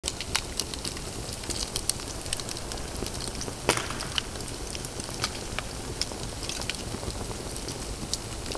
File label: fire small loop